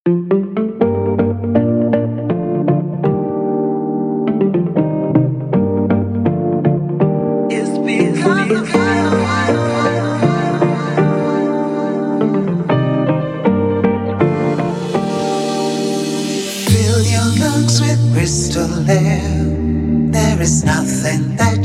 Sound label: iphone ringtone